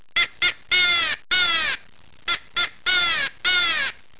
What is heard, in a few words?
comeback, The Come Back Call - Version 2, crow